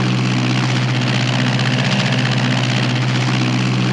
PLANE; vehicles

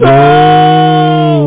die

